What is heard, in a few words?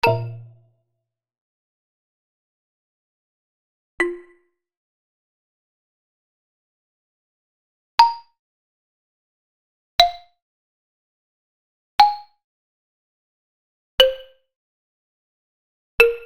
xylophone; startrektng; the infamous xylophone version